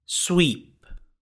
sweep

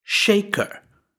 shaker

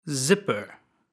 zipper

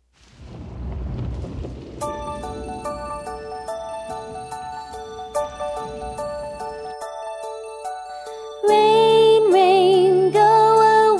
Rain Rain Go Away

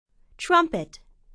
trumpet

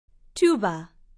tuba

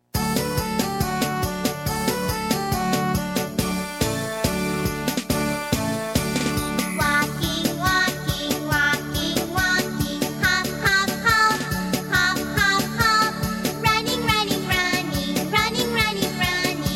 Walking Walking

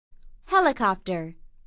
helicopter

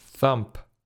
thump

